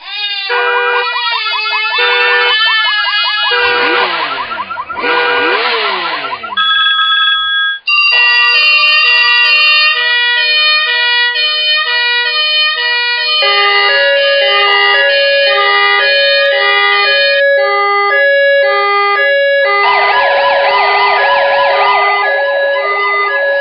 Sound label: Horible Alarm Sounds